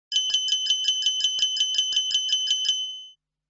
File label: nokia bespoke